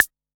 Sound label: Analog MT40 Clave